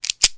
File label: pistol trigger, gun; customnpcs